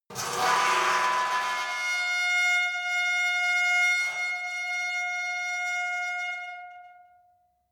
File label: metal squeal saturated intense; industrie-wirtschaft